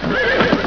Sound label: neigh